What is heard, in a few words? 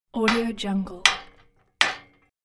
scrap metal hitting; industrial sounds